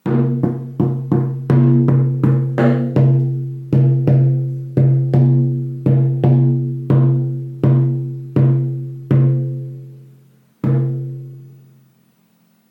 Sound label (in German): Trommel Baum Mit Fell; schamanentrommel baum mit fell